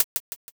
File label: hihat